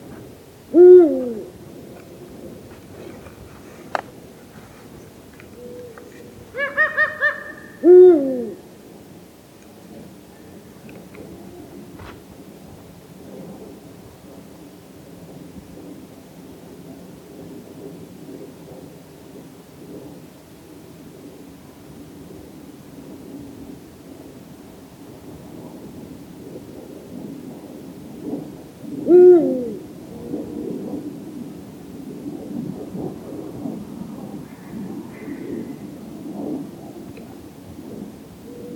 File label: Eurasian Eagle Owl Bubo bubo